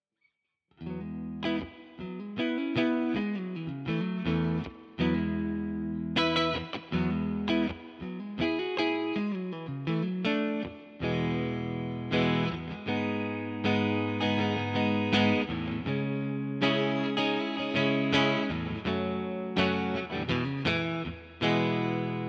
HSS Mid Clean

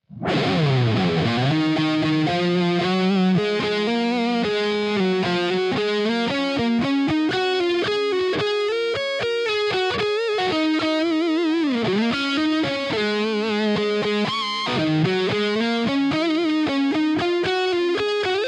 Dist Neck, electric guitars; Gusta EX All Black MOO Big Ass Signature

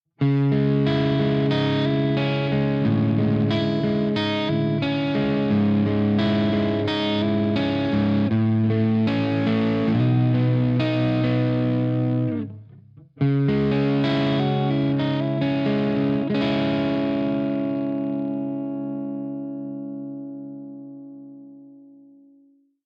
Clean Neck, electric guitars; Gusta EX All Black MOO Big Ass Signature

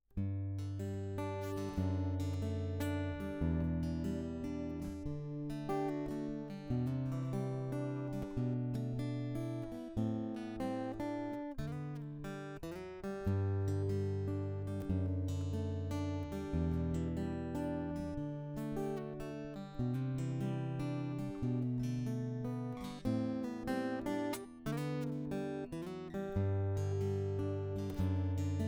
Direct Guitar Picking; GDX 1C

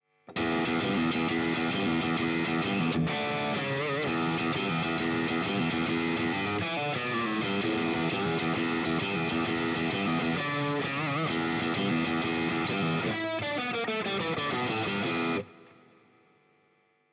Middle Drive, electric guitars; Gusta GST Standard; gst models

